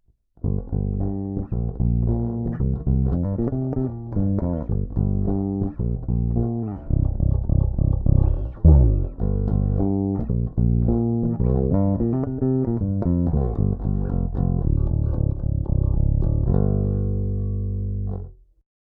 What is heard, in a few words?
Neck PU Fingerstyle; Marleaux